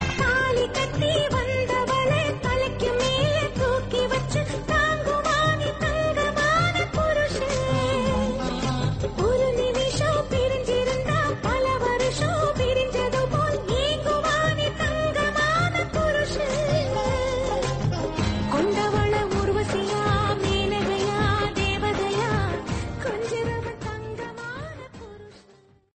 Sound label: Tamil